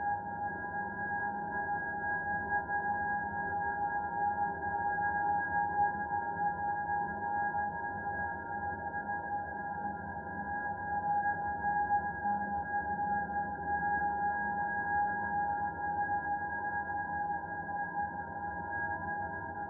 Drone whistle with dissonant chord beneath and background breathy rumble